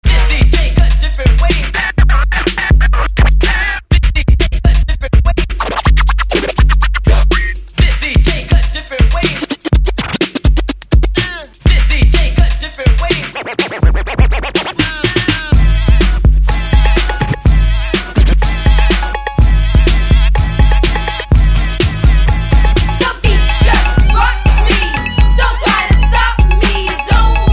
Solo Bass; DDR

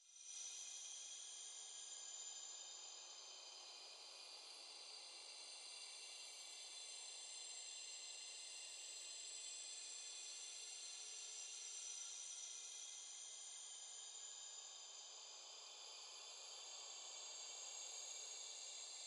Forest Fight Energy

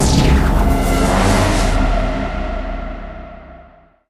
Bonus End; Nano Assault Neo-X

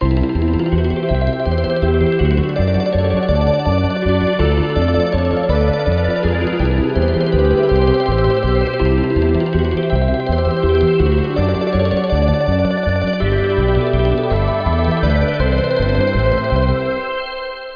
Ride

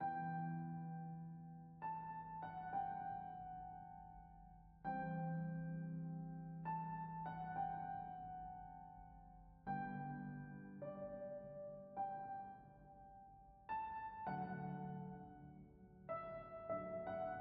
Piano; Fragile Fighter

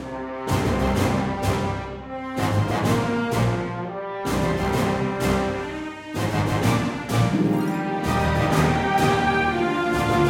Game Complete; Bionicle Heroes

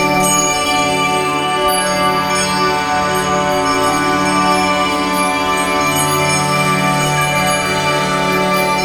temple chord; Shangri La

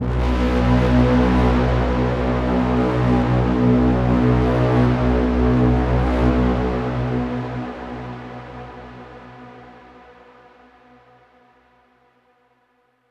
Overlay Drone

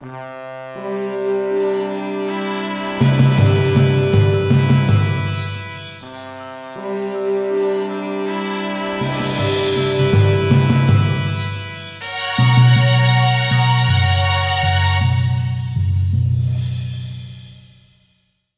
Jingle Stageclear; Azito 3D; 3DS

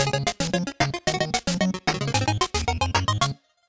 Turkey Hunt Opening Sting; Jump Gunners

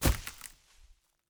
foliage land; teardown ps5 windows xbox series xs gamerip 2022

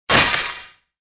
scaffolding break; Angry Birds Space

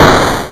Impact

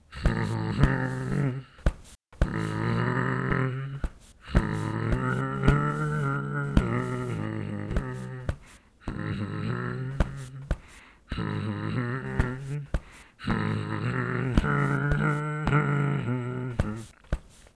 Bauss Hum - Better Beats Faster; fashion police squad beta

